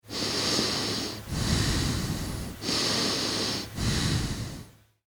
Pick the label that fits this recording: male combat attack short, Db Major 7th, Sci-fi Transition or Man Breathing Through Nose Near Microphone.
Man Breathing Through Nose Near Microphone